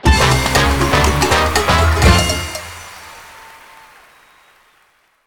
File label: clash free spin; santa vs rudolf